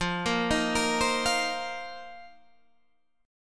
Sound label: A sharp Suspended 2nd Suspended 4th